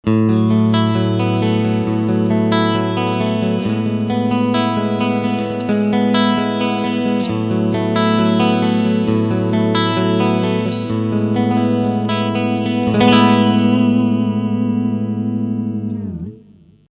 Picking Singlecoil

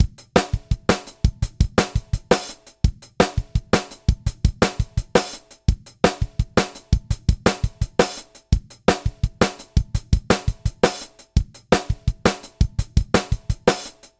IQ Series Limiter V2 Beat clean; HOFA

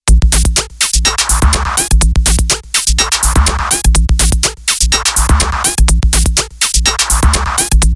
Loop

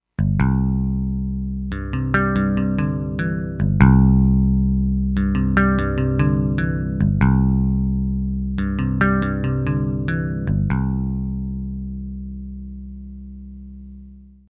S750 Danelectro

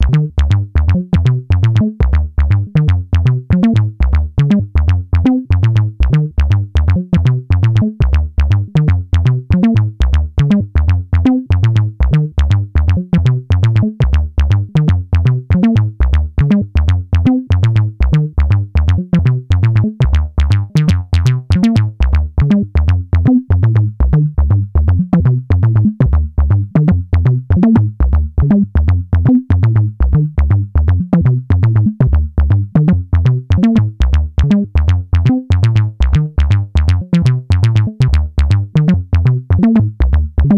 Dreadbox Chromatic Module 303ish

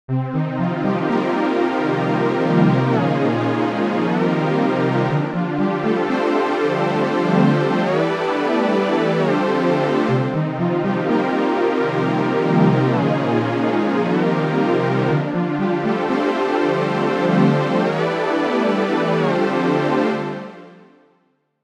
Osc Unisono max

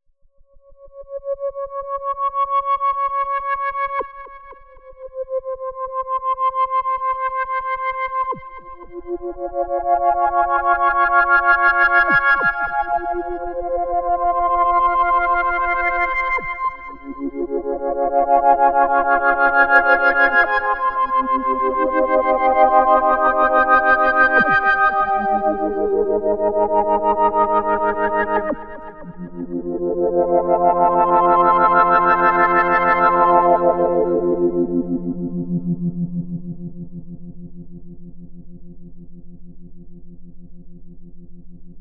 Test Ramp Generator

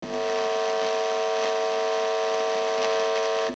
Noise RX 7

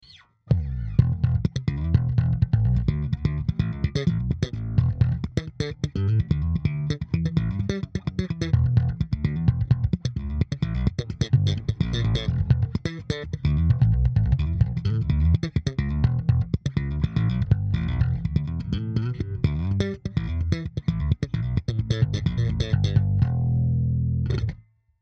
Slap

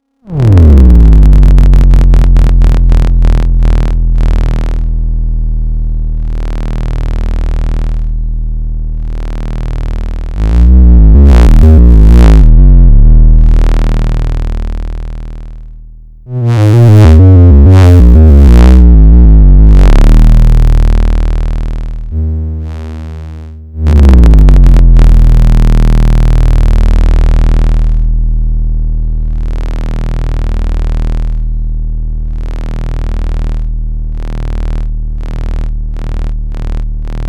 A110 2 VCO Saw Sine dry

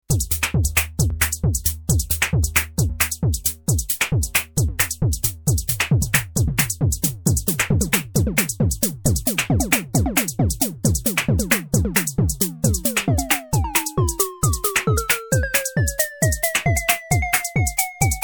Synth

